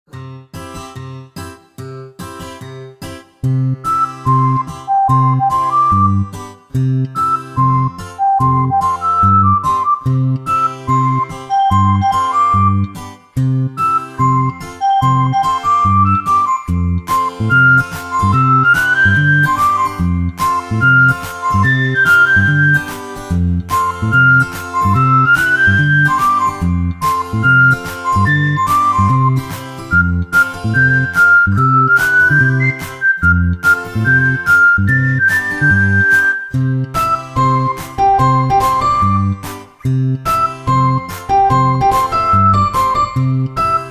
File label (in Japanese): kuchibue Loop; ameagari